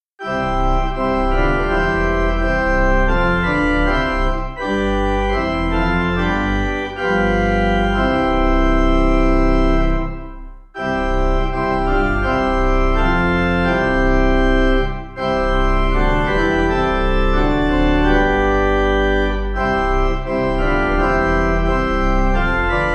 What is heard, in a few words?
The Holy Ghost Pipe; Come, Holy Ghost, all-quickening fire